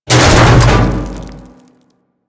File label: Storage Container Smash; Objects; Grineer; Warframe